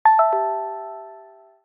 Alert low battery; Lumia Ringtones

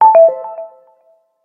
Remind, ringtones; Lumia Ringtones

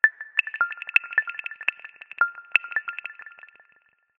Nfc In Range; Lumia Ringtones

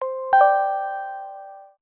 Alert, ringtones; Lumia Ringtones

